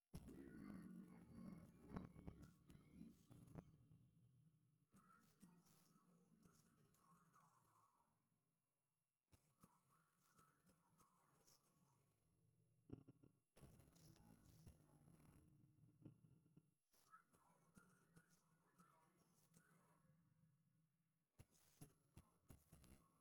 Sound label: piano